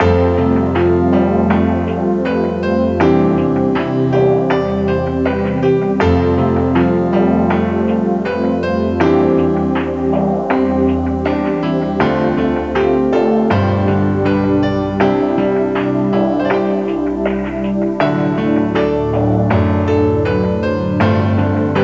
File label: BGM Staff Roll; puzzling masterpiece exploration 2009 nds gamerip